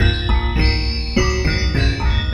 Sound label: Oriental Delay; Sonic Foundry (Sony Creative Software); Ma Ja Le Ethereal Textures